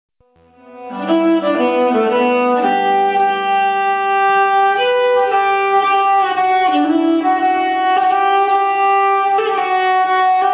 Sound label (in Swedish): Shottis, nyckelharpa; Shottis Villemann